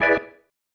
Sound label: Stab One Shots, Organ